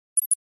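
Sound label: Flash Beep Electronic Double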